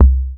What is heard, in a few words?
Sub Perc, Bassdrums; Vengeance Samples